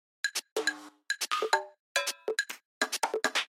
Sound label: percus